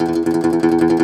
DOMRA, Plucked Strings

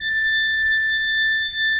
A5, Cathedral Organ; MIDI-ORGAN; Propeller Island

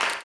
CLAP, blues & soul; ILIO; Vocal Planet

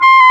WND CLARIN, Clarinets; Clar Bryt

